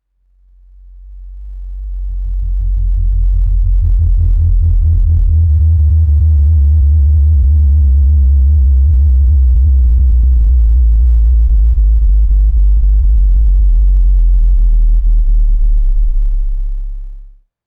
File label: descending sub bass power down rumble